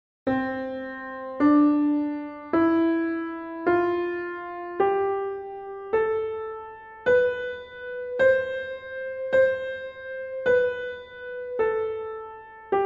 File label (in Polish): Gama C-dur